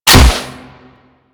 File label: Hunting Bow Fire 3D A; Warframe; Weapons